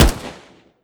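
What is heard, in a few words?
augolf fire player, weapons; gmod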